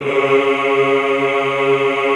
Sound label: EHH C#2, Classical Choir; Best Service